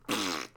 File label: squish; bricks in motion sound library